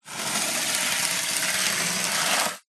household hairbrush bristles plastic scrape wood wall